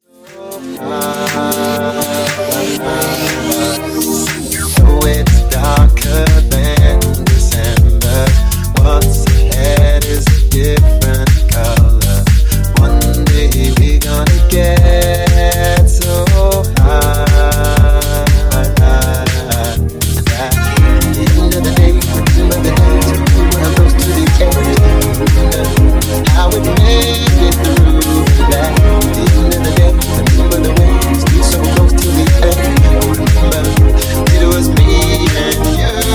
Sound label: Funk Nu Disco House, 120Bpm